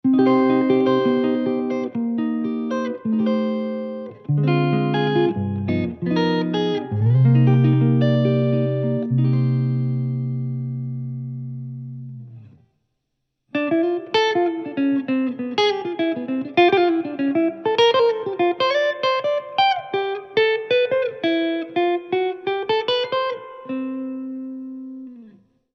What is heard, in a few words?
tone marshall jazz